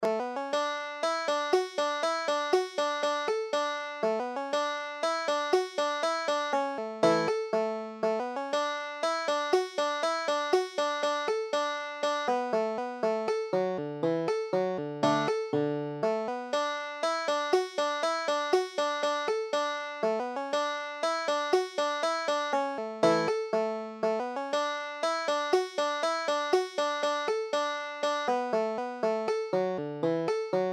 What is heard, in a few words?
banjo